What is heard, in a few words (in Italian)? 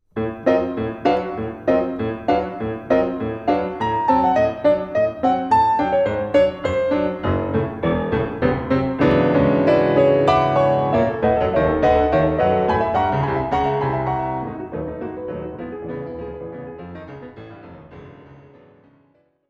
Sonata N.1 Per Pianoforte I Tempo